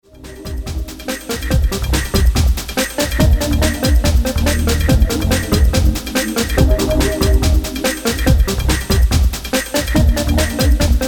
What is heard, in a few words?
chill